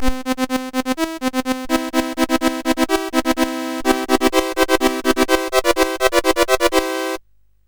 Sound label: Rocket Ball, CSG8580R5; GAMES